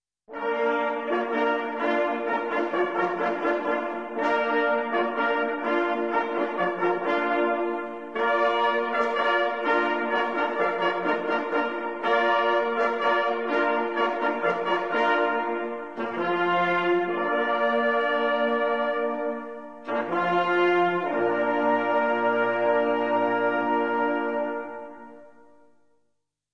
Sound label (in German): sautot, signale